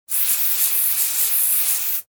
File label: water